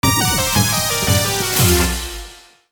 Mini Game Failed; Fate/Unlimited Codes

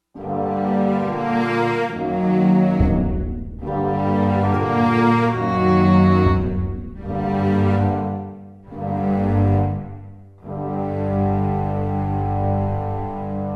Combat Lose; Costume Quest

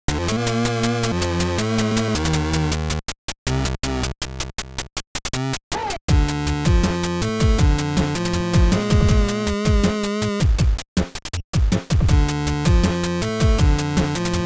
music

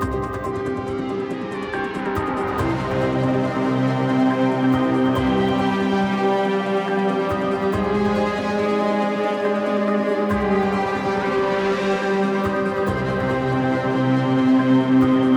Player Losing

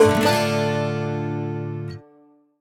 mission progress; Western

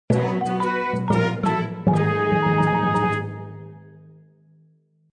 Level Failed; Angry Duck Cannon 1.5

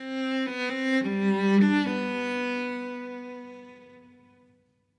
Music Level Argument Stinger; Krish

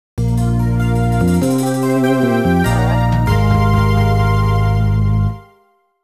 Battle Flyover; Mario Kart DS

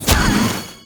Fx Poof; Levels Hub Diner 07 Light Street; Fx Facilier Poof

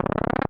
Camera Change; The Great Empire; Allstars Collection

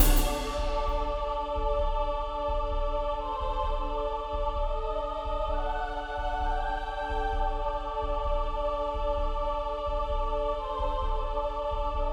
Desert Level loop; Rezrog; Depard; Gems of Rezrog

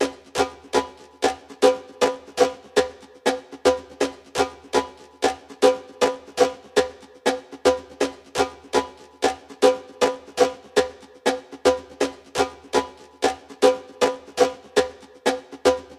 Jungle Perc; Super Exploding Zoo; ps vita ps4 gamerip 2015

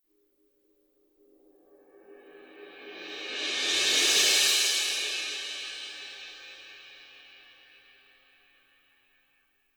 Cymbal

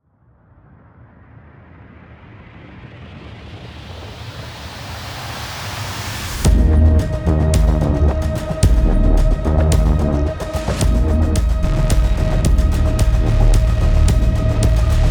Hell Swamp Music Main Ambient; Dlc1 Hell Swamp Music Main Ambient 7

